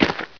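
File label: dirt, footsteps; hl2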